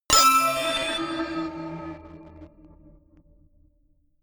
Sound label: notification glockenspiel crazy third; instrumente